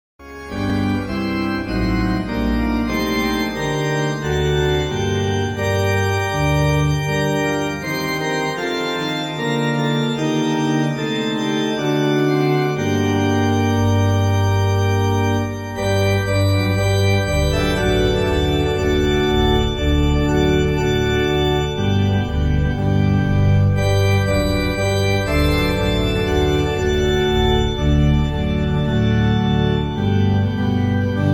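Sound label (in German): Uebung, Farfisa Caroline; Orgel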